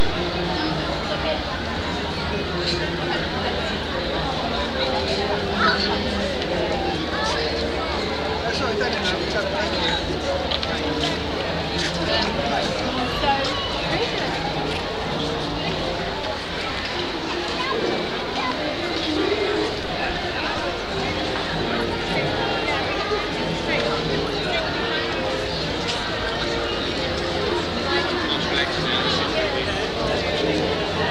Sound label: vintage fair atmosphere southbank london